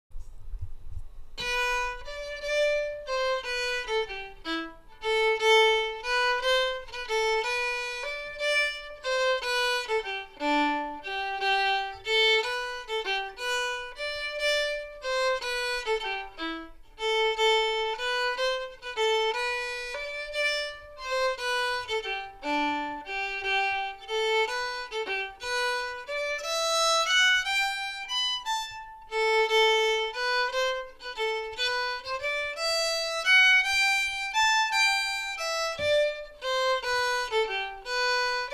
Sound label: slip jig slow